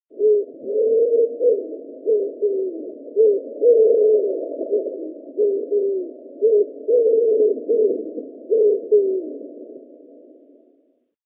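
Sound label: wood pigeon voice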